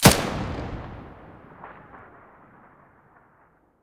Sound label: muzzle mono